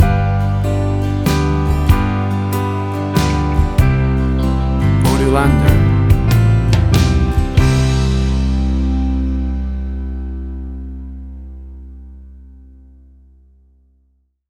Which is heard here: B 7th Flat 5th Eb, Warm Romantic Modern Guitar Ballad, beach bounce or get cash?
Warm Romantic Modern Guitar Ballad